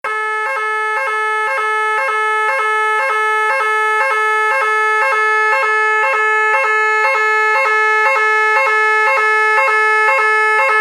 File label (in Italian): Bitonale Vedetta, Sirena elettronica di grande potenza; Vedetta ABM 500 4TA; lasonora